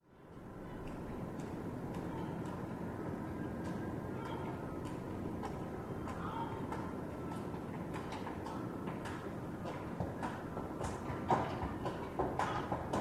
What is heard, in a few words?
Caleche down alley